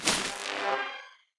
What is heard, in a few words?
Deathrest Casket Enter